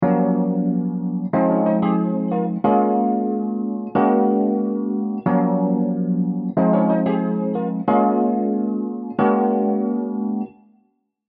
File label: Rhodes Part Merged